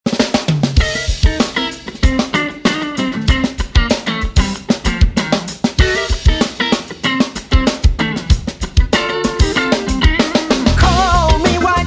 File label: hunk funk